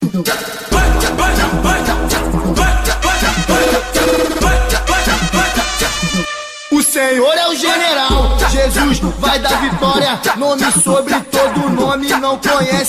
funk gospel

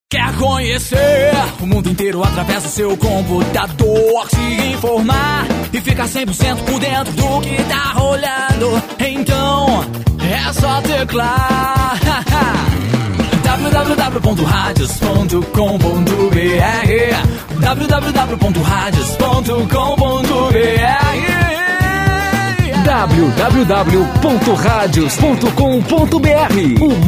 jingles radio jovem

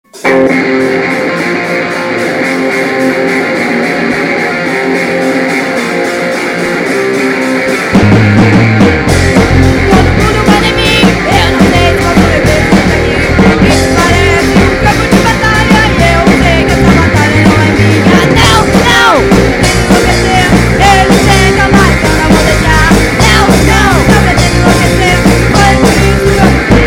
gritos de escola

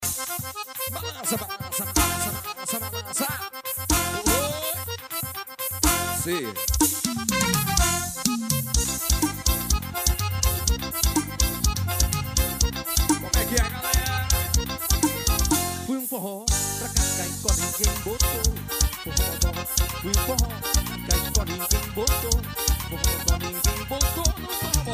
forró

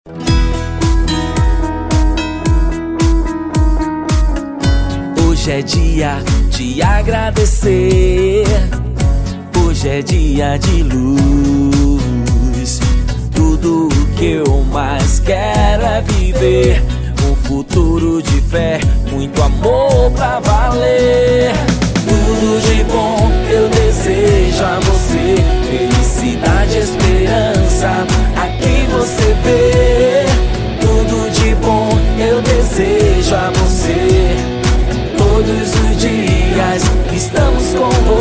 jingle tv

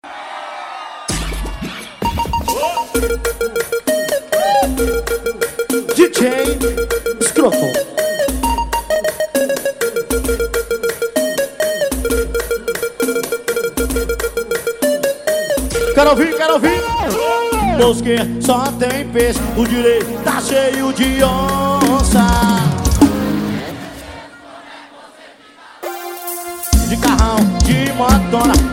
forró

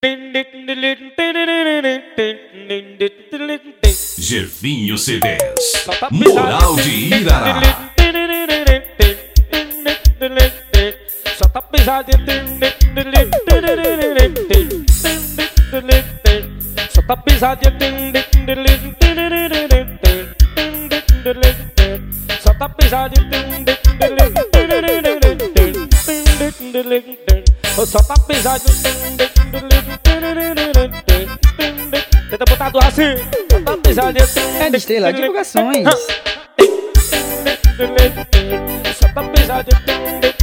guitarrinha

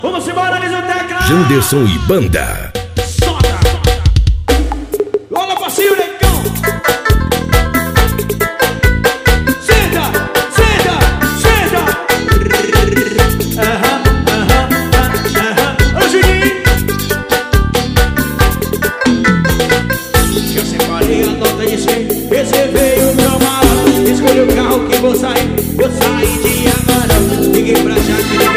banda ao vivo

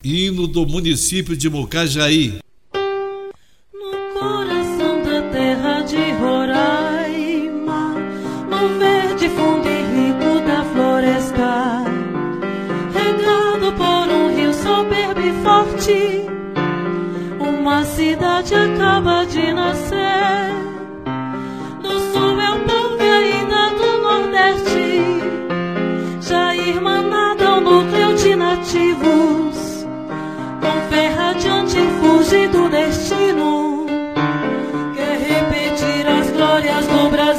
hino

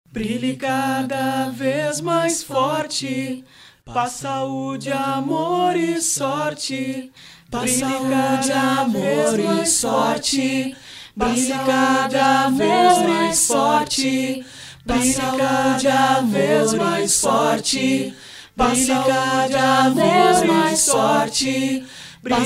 mantra brilhe

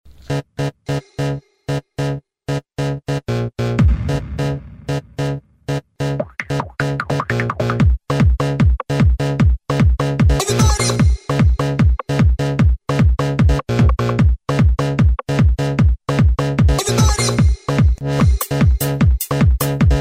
som automotivo

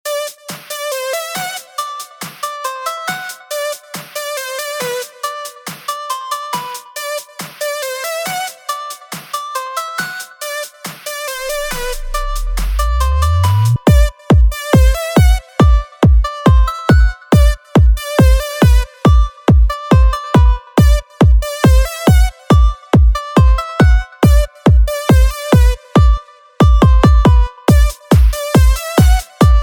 pancadao automotivo

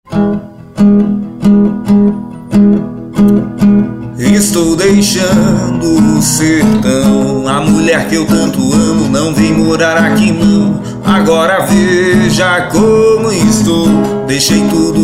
rock na viola

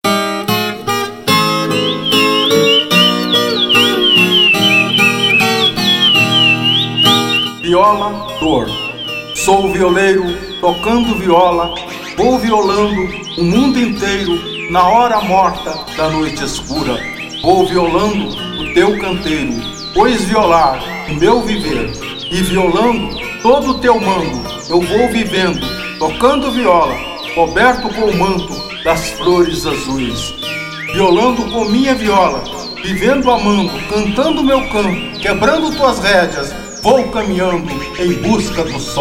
trilha

